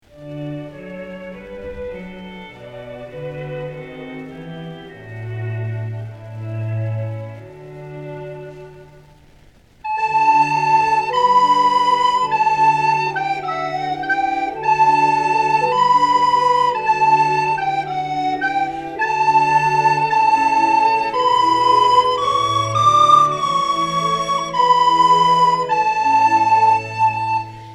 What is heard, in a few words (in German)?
blockfloete; oh du froehliche